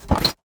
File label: Short Metal Handling Armor